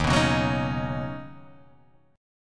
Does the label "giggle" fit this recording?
No